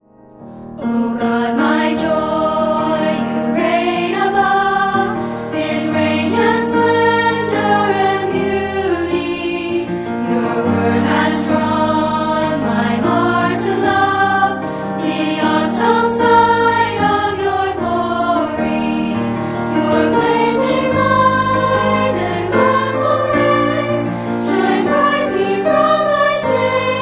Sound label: piano